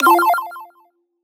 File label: Retro FX; Cymatics; Video Game Sample Pack Vol 1